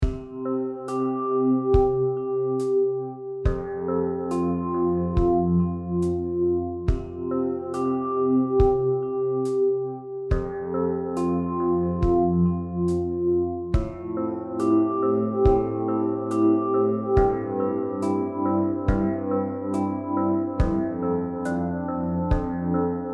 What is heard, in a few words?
silent Echo